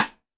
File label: Snare, Roland TD-20